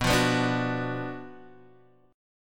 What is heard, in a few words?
Gb Major 7th Suspended 4th Sharp 5th